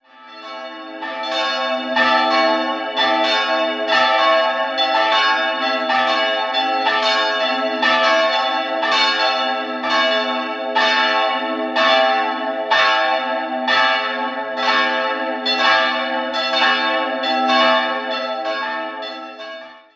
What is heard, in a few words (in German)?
Reissing, Glocken